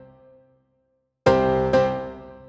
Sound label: Latin Lounge Piano